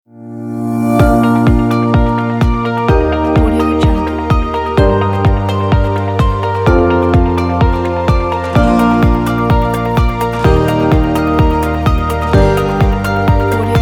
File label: corporate logo pack; densostudio